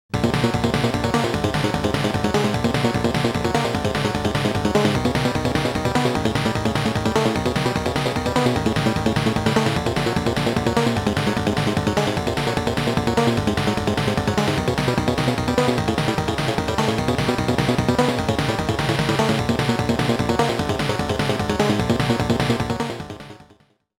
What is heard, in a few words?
Middle Boss BGM; super star soldier